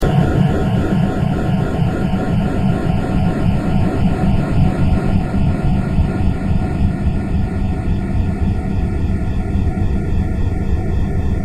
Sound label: air